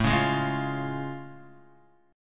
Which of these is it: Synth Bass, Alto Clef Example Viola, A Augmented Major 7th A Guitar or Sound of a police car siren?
A Augmented Major 7th A Guitar